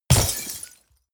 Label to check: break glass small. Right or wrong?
Right